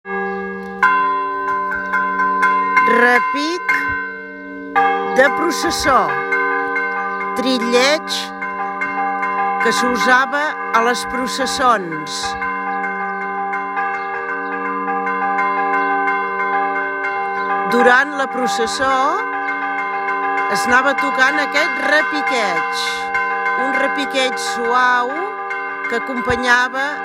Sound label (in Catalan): Repic-trilleig de processó, campanes; La Tallada; Toc de campanes